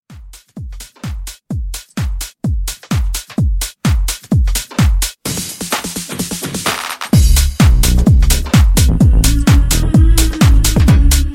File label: House 128; 2025 House Edit